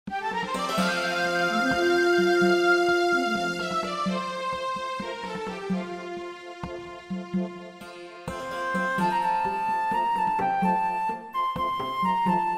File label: forest level